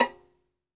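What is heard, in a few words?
solo violin, strings; ILIO; Synclavier Strings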